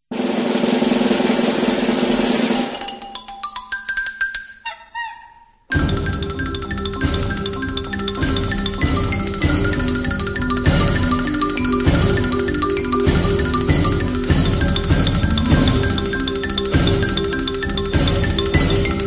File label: Xylophone Percussions